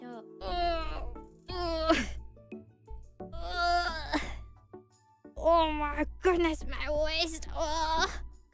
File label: stretching